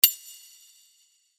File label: Sword Sound; FXHome; Fighting Sounds